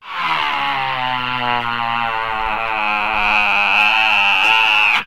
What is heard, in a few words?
ah long buzzy; ooh ahh